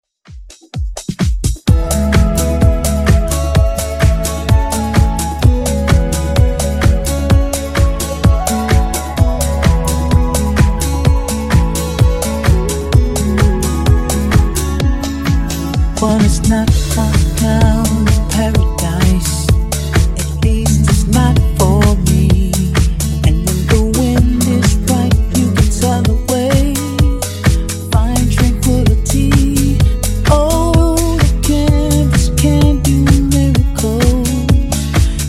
Funk Nudisco 128Bpm

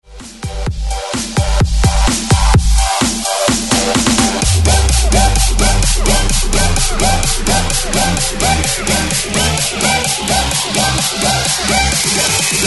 130Bpm BREAKMIX

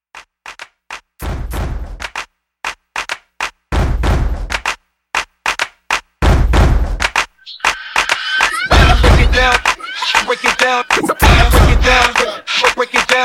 Old School Hype Scratch Edit 96

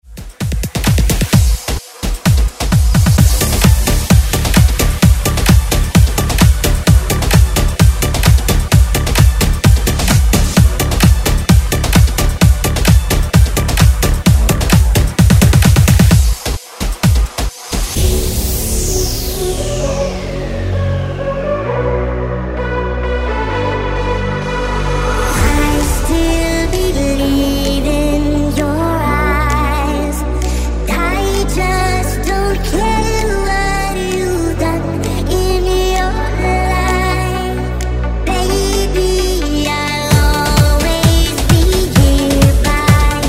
Tribal House Redrum

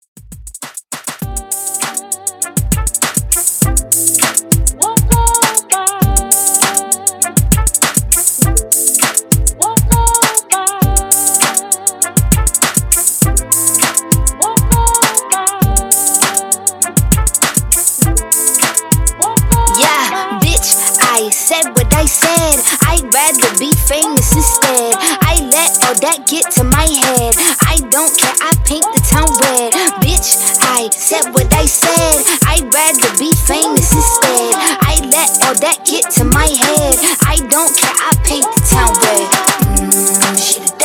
Club Top40 100 BPM; Redrum

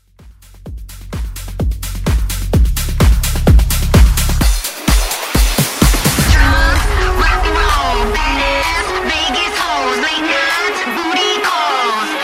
Blend Hype

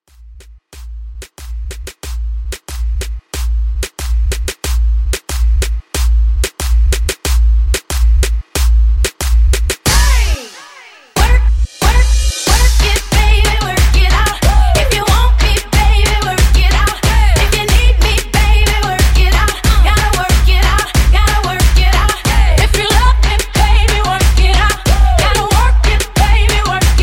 RB Moombah Starter 92 Bpm

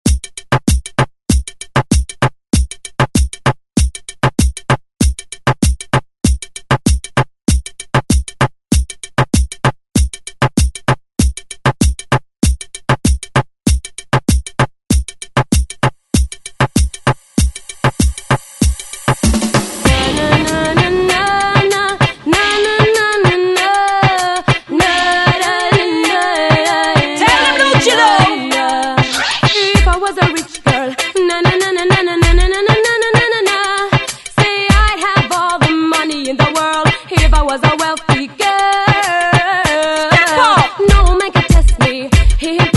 90s ReDrum; DJ Mhark

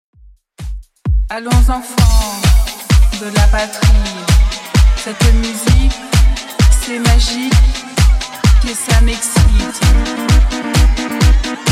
90s, 130bpm

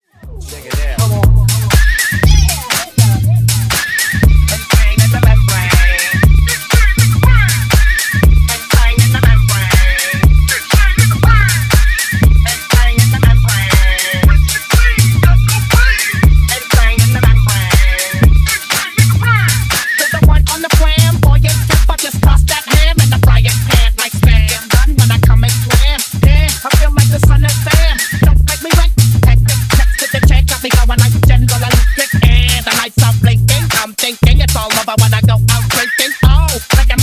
Funk Nu Disco House, 120Bpm

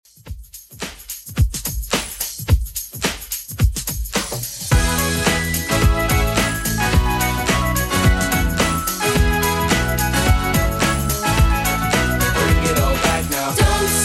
90s Redrum, 108 bpm

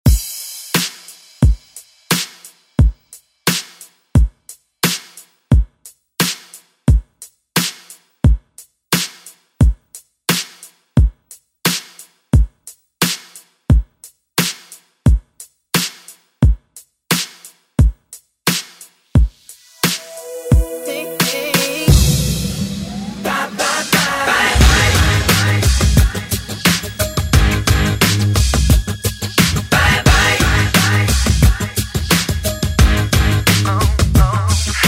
2025 Re Drum

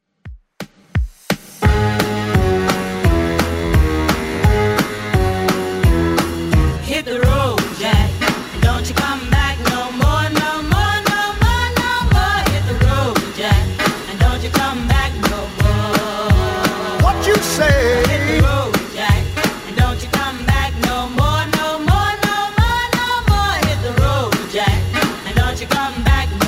60s Redrum 172bpm

